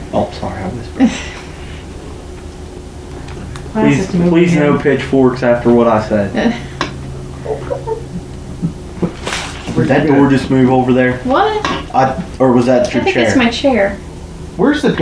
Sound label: giggle